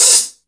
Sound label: HH, Drum Dry; East Collexion; Drum 1 Dry